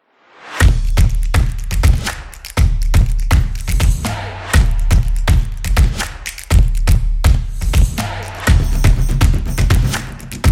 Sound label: Drums Action Beat; samba